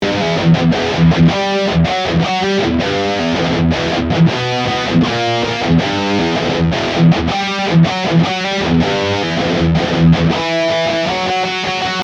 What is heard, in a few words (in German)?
Riff Idee zweites Riff